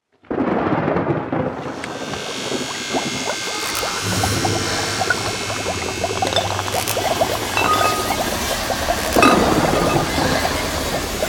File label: Frankenstein Lab, Laboratory, 1950S; Horror; Science, Fiction; Monster Sound Effects